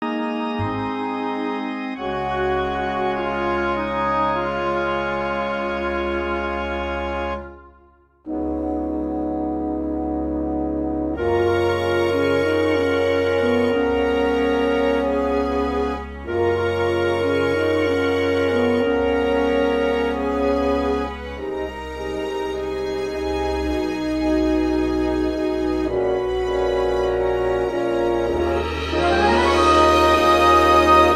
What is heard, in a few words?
Instrumental Track; auditions